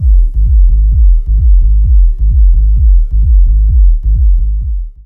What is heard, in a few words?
bassss